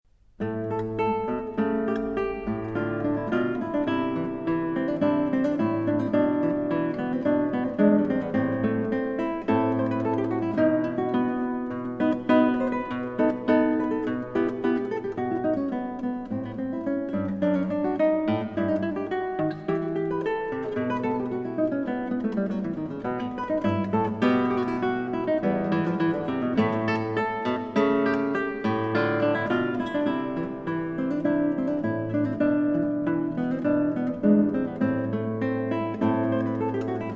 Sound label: bourree; weiss